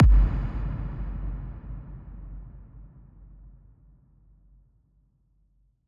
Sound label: kick reverb